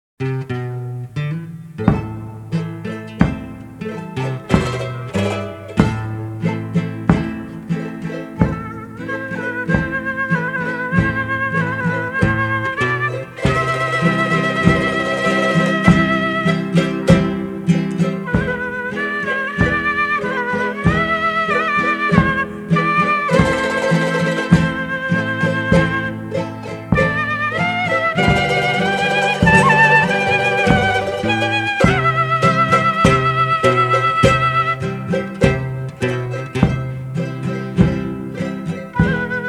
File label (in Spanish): mi cordillera andina, les flutes indiennes d'amerique du sud